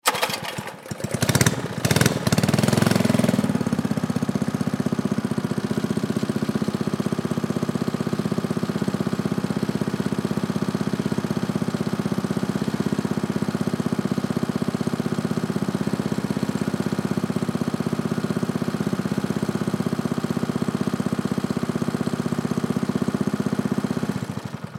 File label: Go Kart Pull Start, Go Kart Pull Start Idle Drive Away; Go Kart Pull Start Engine Rumble; Go-Carts; Vehicles; Sports; Blastwave